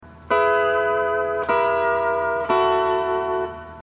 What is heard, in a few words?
hawaiian piece